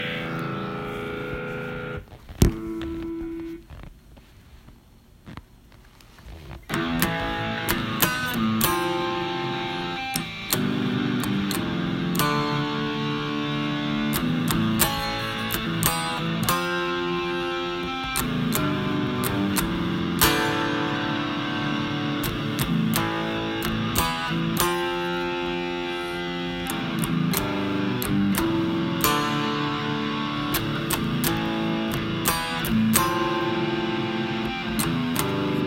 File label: Huge Slow Riff